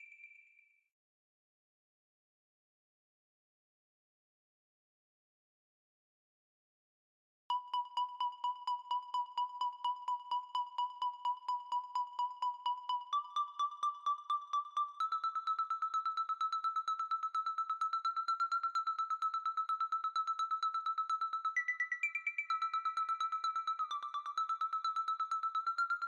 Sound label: Doom Mix Stem Loop Layer 3; Centipede Recharged